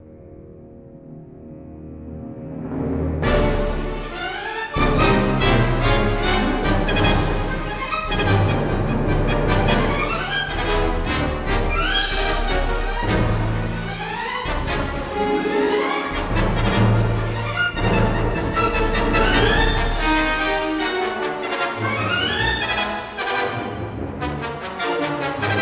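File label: battle loop, music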